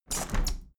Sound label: window handle plastic squeak close heavy; objekte; fenster